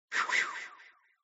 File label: spin